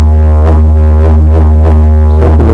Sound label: waw, didjeridu